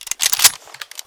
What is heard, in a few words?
clip in; weapons; csgo; ro2 k98; css